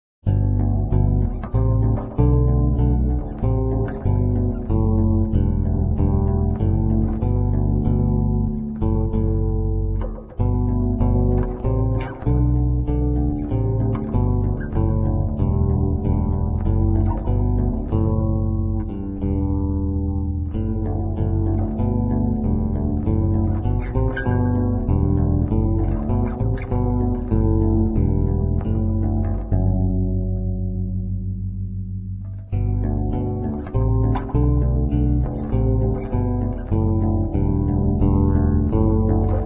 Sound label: Guitar Electric Bass